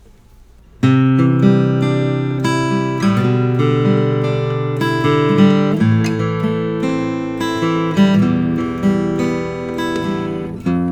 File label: c10 a ss custom jet black gloss, guitars; collings